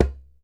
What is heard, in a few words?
DJEMBE, African Perc